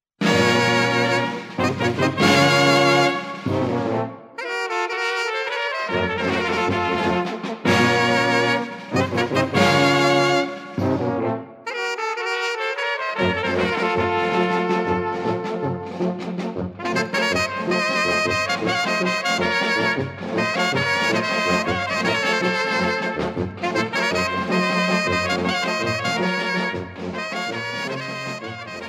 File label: Romski cocek